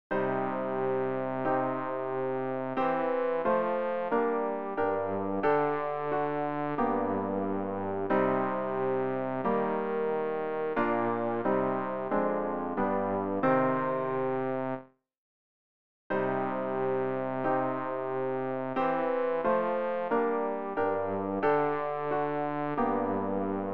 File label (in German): bass; Ref. Gesangbuch; RG 616: Gott der Tage